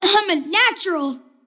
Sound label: lasthit pain; kidvoker; dota; invoker